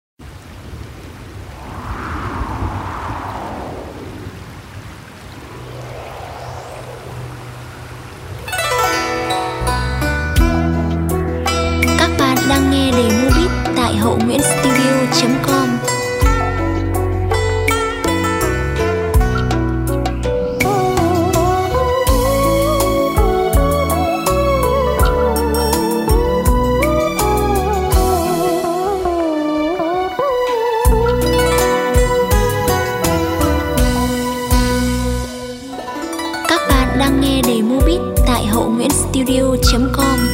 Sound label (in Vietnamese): Chieu nuoc lu beat